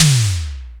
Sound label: JD800 Dance Card Drums